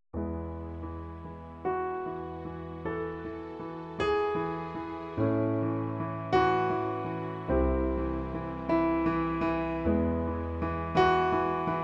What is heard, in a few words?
Trailer Music